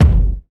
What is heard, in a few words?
steel kick drum sound g key, Royality free bass drum sample tuned to the G note.